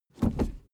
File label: suv idle 1st gear change fast; verkehr transport; autos